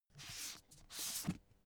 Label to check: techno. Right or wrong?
Wrong